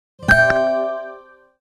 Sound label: Email, Nokia Alerts; Windows Phone apps